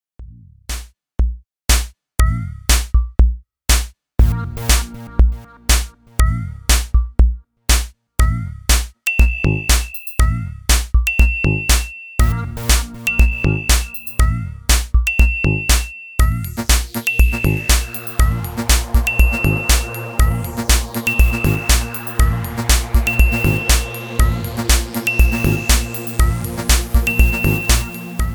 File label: Sphare 120bpm